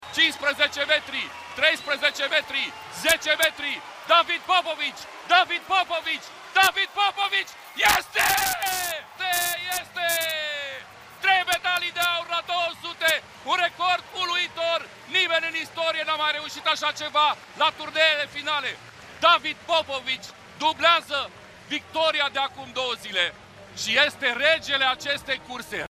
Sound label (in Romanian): ambianta; David Popovici; europenelor de natatie; europa fm